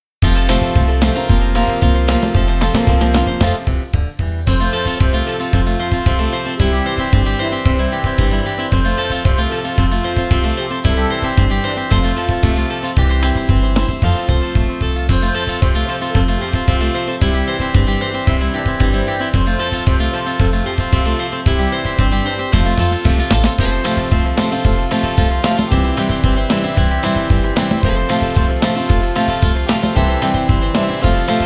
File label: karaoke